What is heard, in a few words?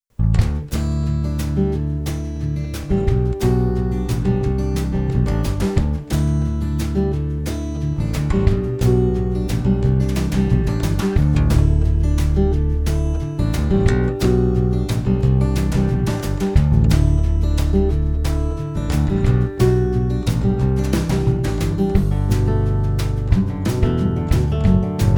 Country Mix, basses; Hybrid 5